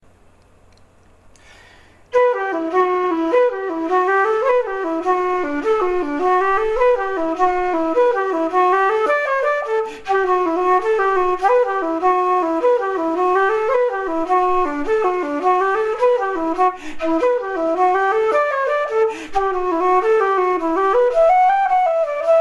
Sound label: Jig